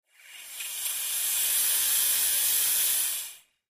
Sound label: Processed Air Release